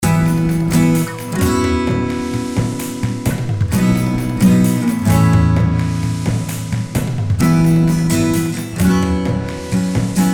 steel string essentials

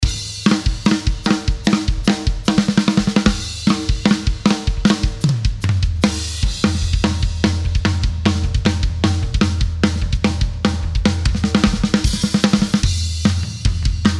punk drums

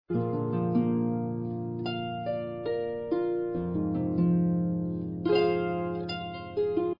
neon a sharp

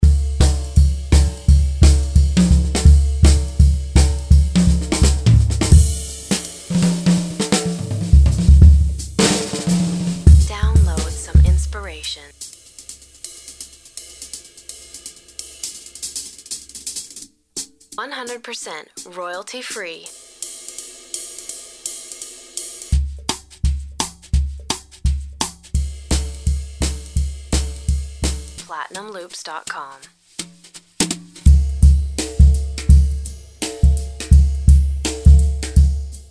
smokin hot jazz drums voice duck

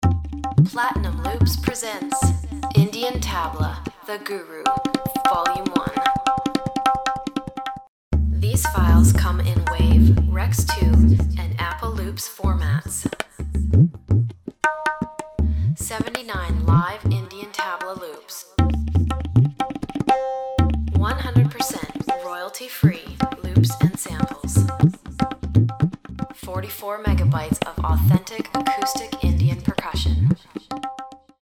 indian tabla; the guru

